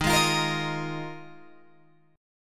D sharp Suspended 2nd Suspended 4th D sharp Guitar Open G